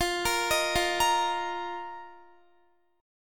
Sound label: F 7th Suspended 4th, Guitar; F7sus4; x,20,20,20,17,17; 4E,4A,5D,5G,5C,6F; arpeggiated